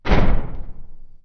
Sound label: mech step; Sid Meier's Civilization 4 Complete; Beyond the Sword; Units